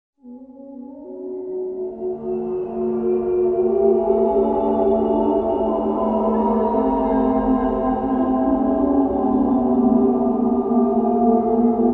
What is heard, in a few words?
moaning spirits, scary sounds